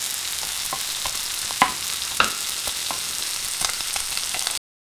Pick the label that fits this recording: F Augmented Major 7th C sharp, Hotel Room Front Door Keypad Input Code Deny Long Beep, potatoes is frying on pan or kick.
potatoes is frying on pan